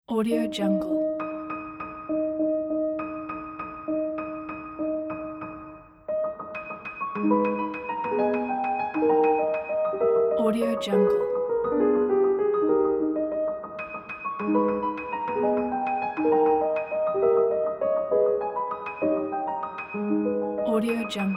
la campanella; liszt

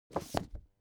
foley book take shelve; smartsoundfx

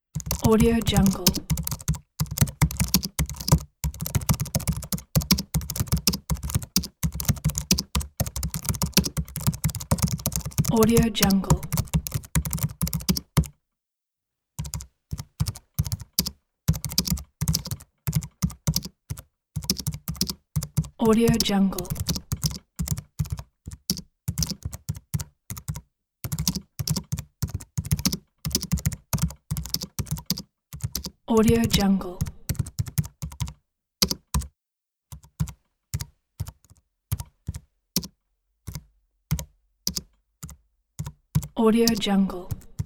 typing on computer keyboard

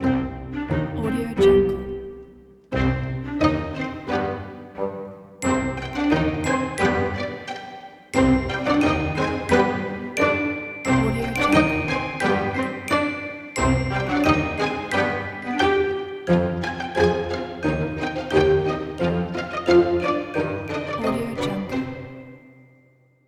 quirky and sneaky ident

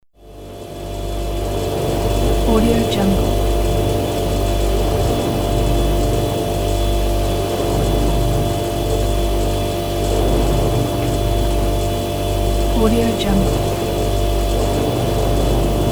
refrigerator

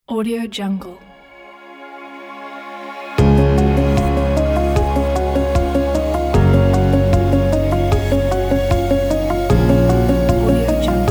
piano inspiring logo; beunghar

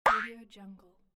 jump

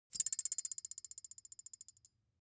object metal thin boing vibrate; smartsoundfx

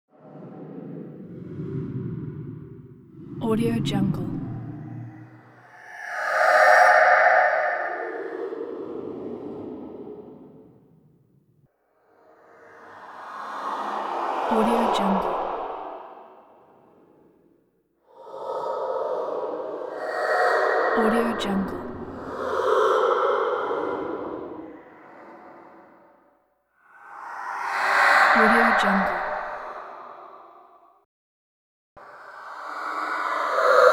mystical and horrible sounds; almira23

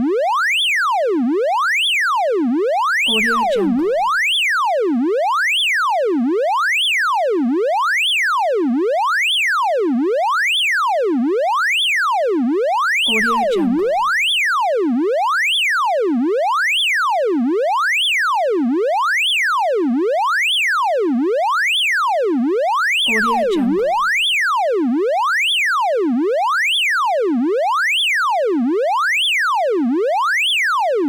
alarm oscillating

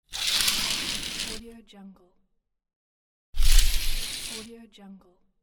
curtain draw; creativetunes

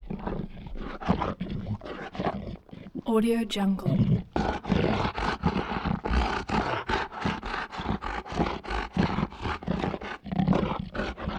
creature breathing growly gasping panting

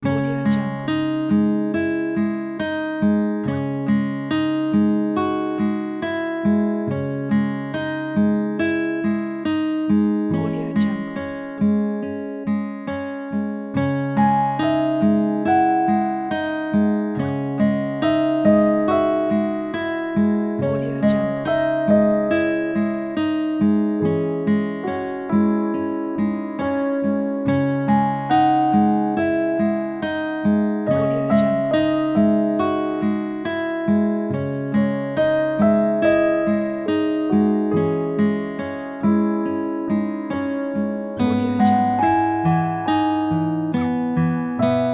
emotional guitar and piano; v8v

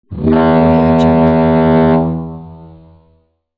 ship foghorn

